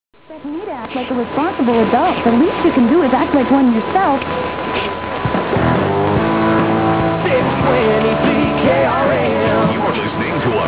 Regina, Saskatchewan is loud regularly at this time of the year; DX Clips from the 2006 DX season; CKRM 620